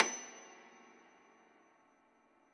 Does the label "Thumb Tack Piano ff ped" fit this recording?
Yes